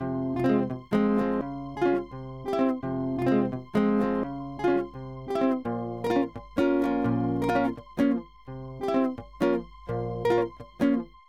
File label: 6 8 Yaldaei